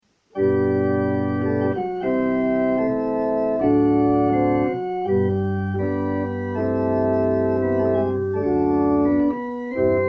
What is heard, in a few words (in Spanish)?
marcha religiosa, organista; Gluck; bodas; palencia